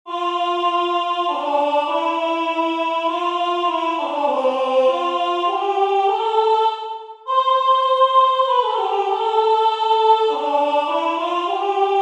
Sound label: plusbel Alto